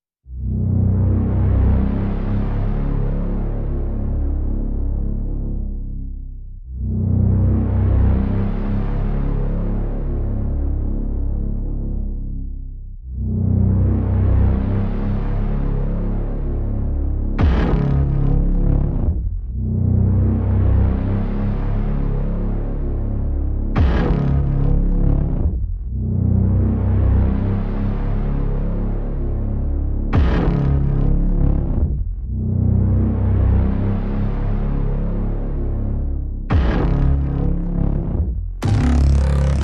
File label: Synths Only